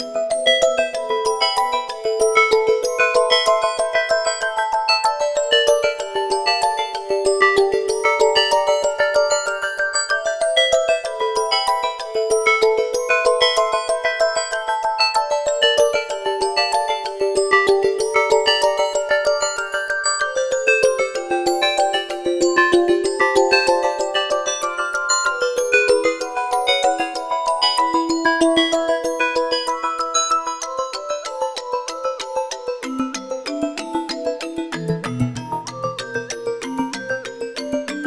Quirky Game World, fantasy

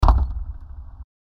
Robot Footstep

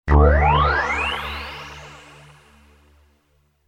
Power Up

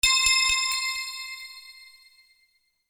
Bells

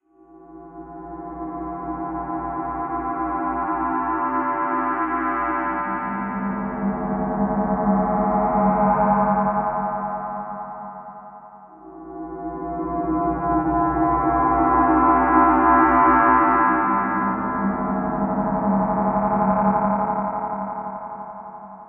Creepy Hollow LoFi

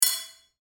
Clank

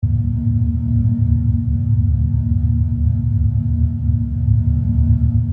Mech Drone

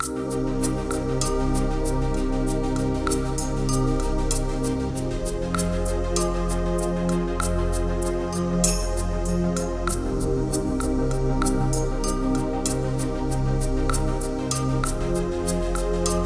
Quirky Dreamscape, fantasy

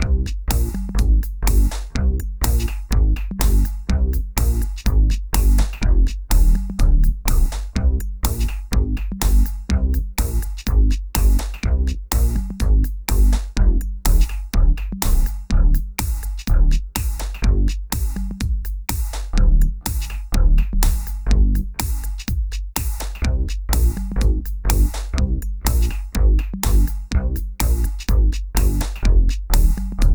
Bass Invaders, funny

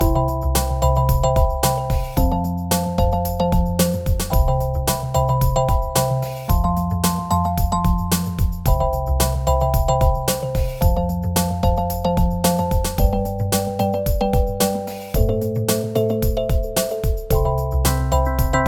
Music Box Puzzler, puzzle music

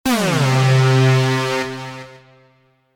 Power Down

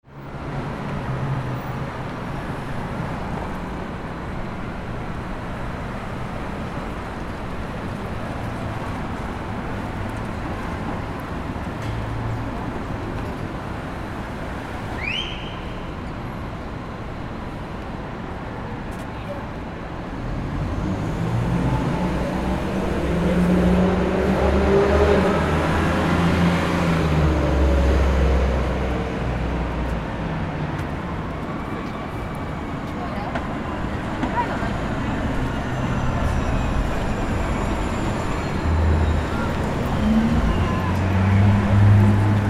Street Corner

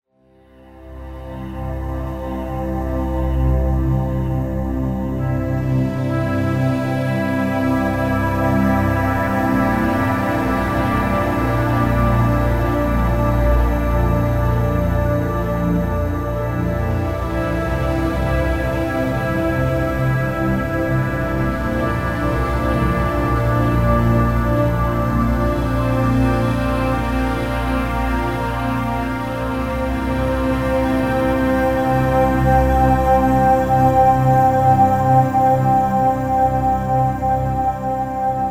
Space Game Intro

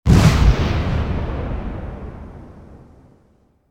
Explosion

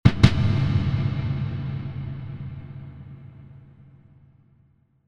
Creepy Percussion

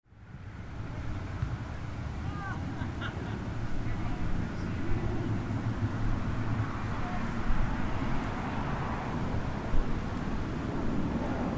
Suburban Park

